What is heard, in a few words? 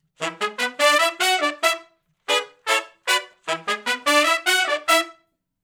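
R&B Riff (E) unison to harmony, Horncraft; Sony Creative Software; Crimson Blue and Fabulous Horncraft 4 RnB; Horncraft for R&B; Sections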